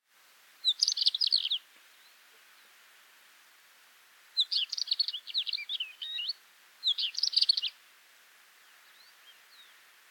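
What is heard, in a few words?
northern wheatear voice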